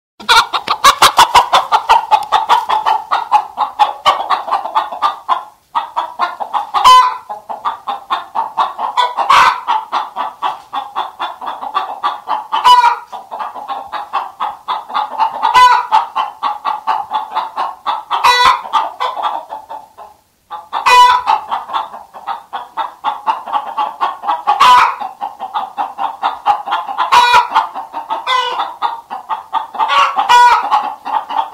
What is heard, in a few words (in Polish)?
Kury w kurniku; na farmie